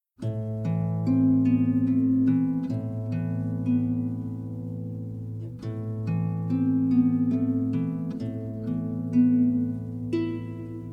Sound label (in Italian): ARPA CELTICA